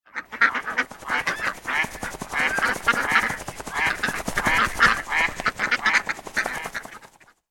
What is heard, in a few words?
Flock of ducks quacks and wings; bird